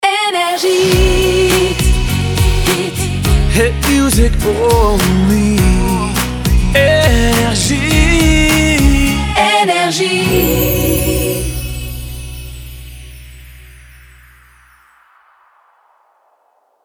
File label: jingle; NRJ France; 103bpm